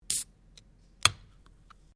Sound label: Can Softdrink Open; various metal cans; Metal (Sound Effects:Materials)